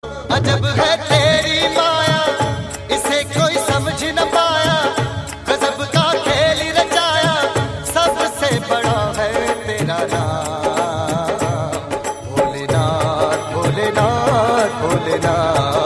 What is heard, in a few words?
lord shiva hindi; Navratri Special